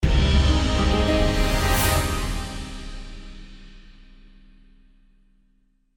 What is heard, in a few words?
Quest Start